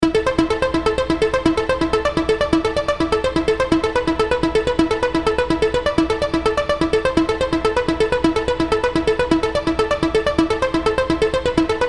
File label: synth dry audio; waves J37